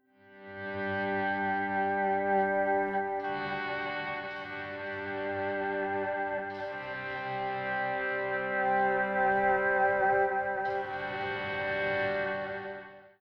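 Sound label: Country Guitar, Chorus 1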